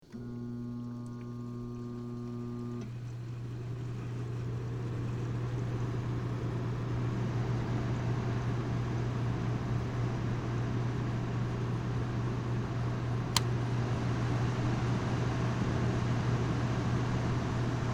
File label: Air Conditioner; Air Conditioner Cycle Thru Speeds Up; Household